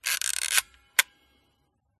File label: smena symbol camera wind film lever; mkh8060; pmsfx